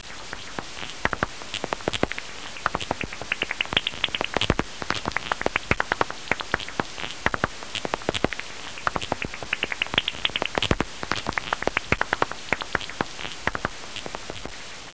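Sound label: pipistrelle feed, bats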